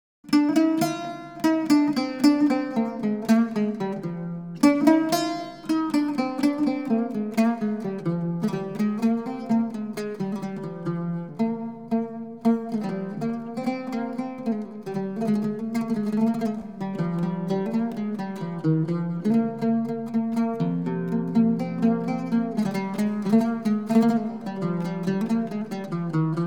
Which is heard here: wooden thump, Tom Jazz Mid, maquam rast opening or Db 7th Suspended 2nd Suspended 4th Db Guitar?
maquam rast opening